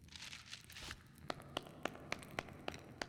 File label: accountant Win; fairymirror